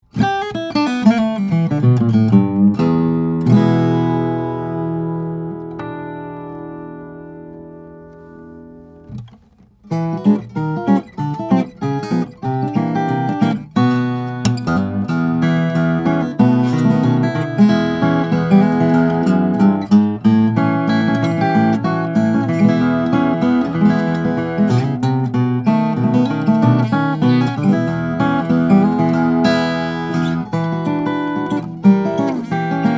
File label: guitars; bourgeois; madagascar rosewood; adirondack spruce; 0-DB Signature